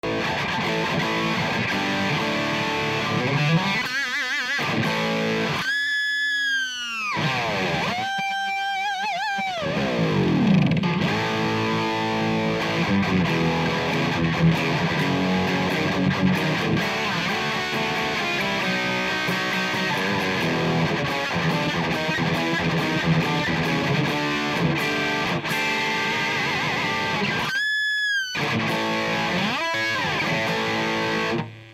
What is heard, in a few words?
Gain Channel Gain 10 Volumen 5 Contour 10